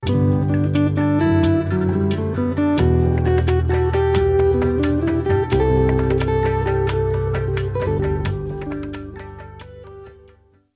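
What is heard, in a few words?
Nokia ringtone; Nokia 1203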